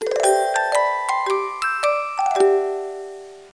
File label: Toy reward; Fisher Price Ready for School Kindergarten